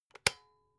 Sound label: electric whisk switch; haus-garten; kueche